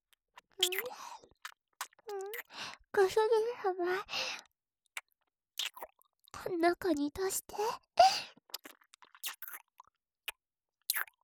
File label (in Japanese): キス; 中に出して